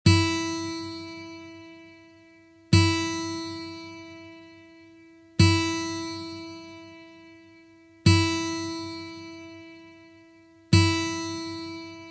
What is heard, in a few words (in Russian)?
struna Zvuki strun akusticheskoj gitary